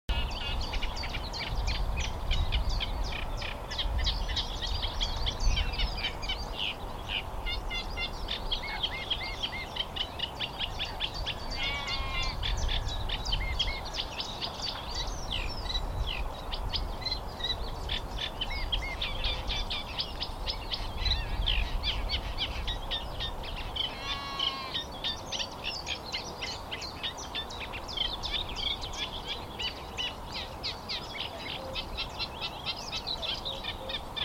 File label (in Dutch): Kleine Karekiet; acrocephalus scirpaceus